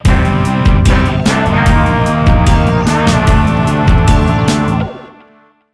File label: Classic Match Lose